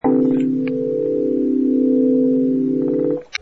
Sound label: Fake Internet Noise